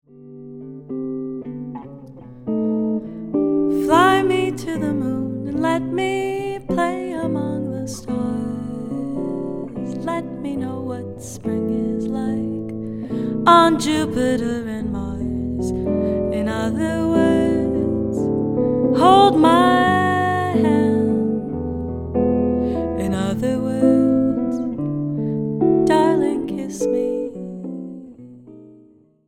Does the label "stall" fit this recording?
No